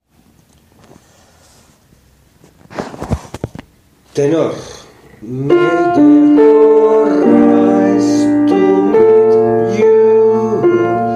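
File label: tenor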